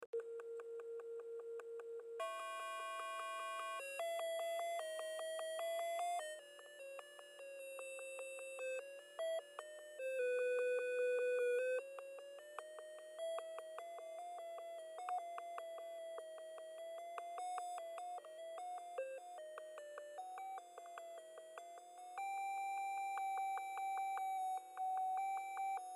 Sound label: sound searching highest point